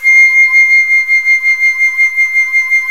FLT FLUTE, Flt vib; Roland L-CDX-03 Disk 1

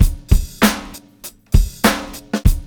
BEAT, Hip Hop; E-MU Formula 4000 Series Vol. 1; Hip Hop Nation; Beats 95-99bpm

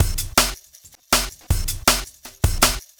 Beat, Futurist Drum & Bass; Drums & Percussion

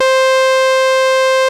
Analogue hits and octaves

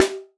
snare organic

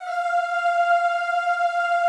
F4-L, Classical Choir; Best Service; Extended Classical Choir

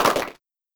Simmons Clap

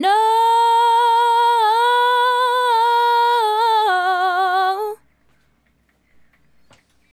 Female Solo, Hip-Hop and RnB Vocals

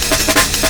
amen; Amen Breaks

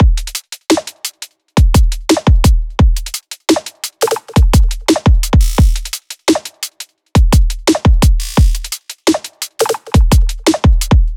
Drum Loops, 172; M8; Electronisounds

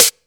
HOUSE DRUM SD; AKAI S6000 CD-ROM - Volume 1; DRUM RYTHM

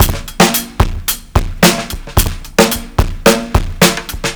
R&B Drums; Sonic Foundry (Sony Creative Software); Live n Ruff

